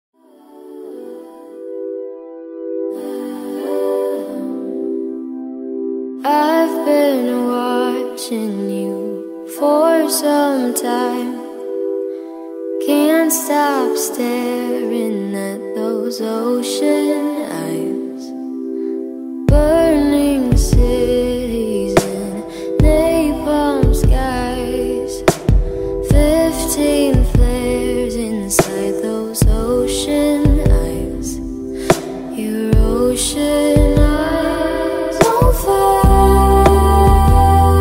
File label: Dark Trap Beat